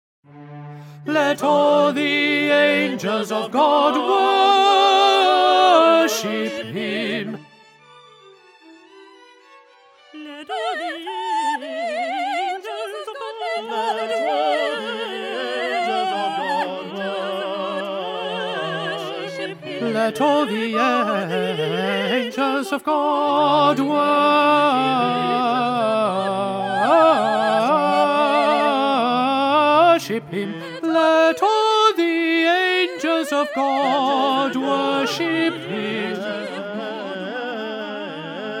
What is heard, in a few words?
Tenor; Handel; Messiah